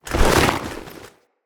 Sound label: Foley Fast; Corpus Lich; Idle Screen